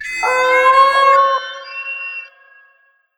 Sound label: VIOLIN FX; USB Soundscan vol.60; Asian Traditions; AKAI; VIOLON FX